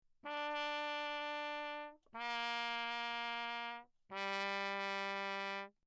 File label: TRP MUTE Bach low; Perinétventiltrompete; Mutes